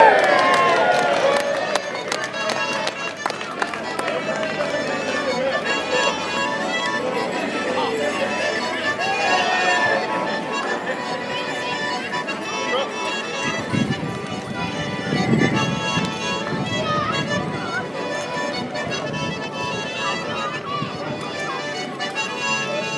dance in bromyard square

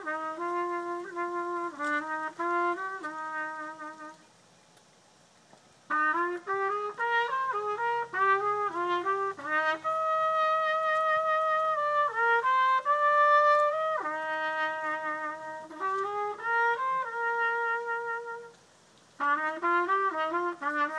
trumpeting at wedding

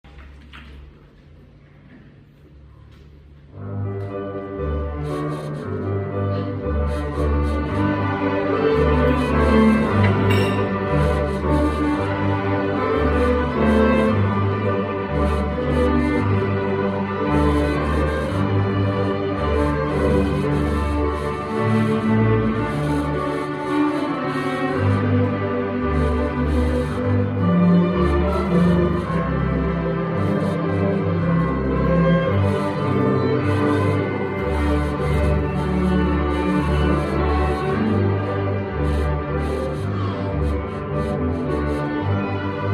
string orchestra

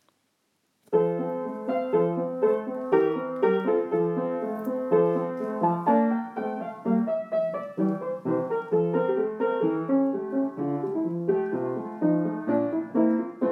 Piano, Joy plays

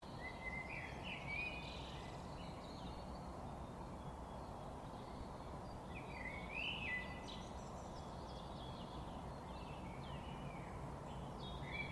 early dawn chorus blackbird and something else

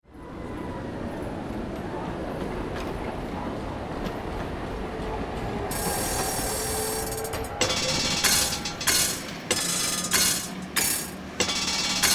belgrade tram waltz

